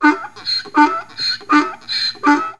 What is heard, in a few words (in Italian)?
asino, versi degli animali; animalandia